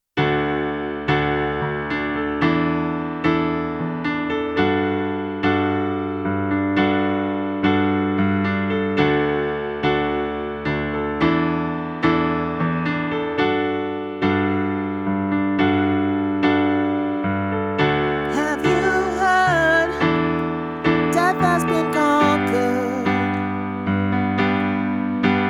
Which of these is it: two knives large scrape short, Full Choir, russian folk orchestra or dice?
Full Choir